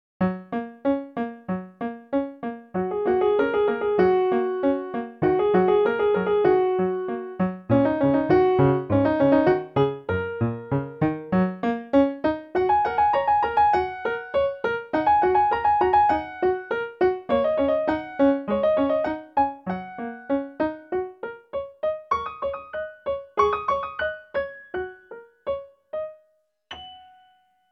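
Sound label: dew drops, etude on black keys, piano; kapli rosy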